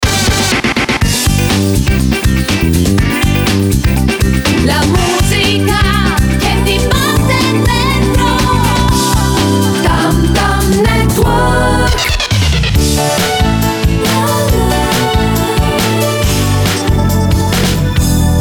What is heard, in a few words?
tamtam, jingle